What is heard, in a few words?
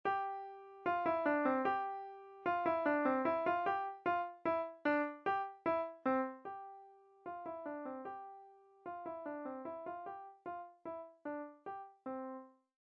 Eighth note Musette, piano; Bach